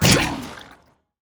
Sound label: magic pipe shoot, crocs tower